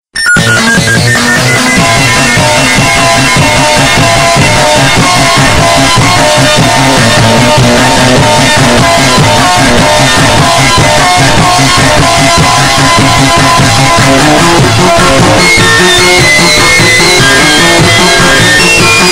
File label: hyper distorted; sanic the hedgehog